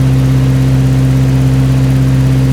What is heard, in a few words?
Toyota Supra-A80 Low; Car Simulator 2; Android